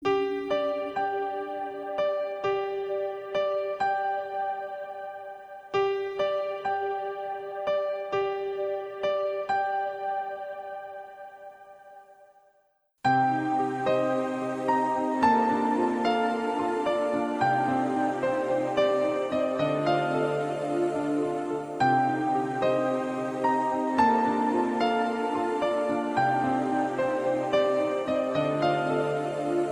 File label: Piano